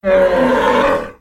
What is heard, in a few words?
Pet Bison Disapprove; creatures pets